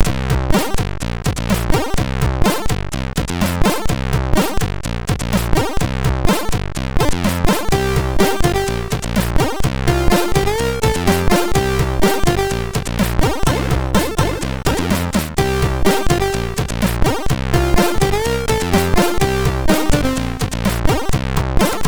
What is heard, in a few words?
loader sid MOS6581R3; Timeout